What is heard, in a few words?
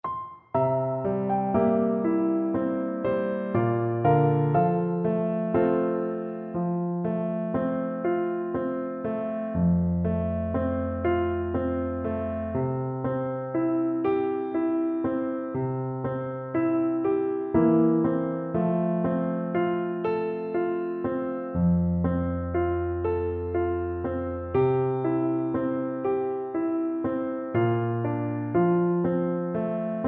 avondale BN, Bassoon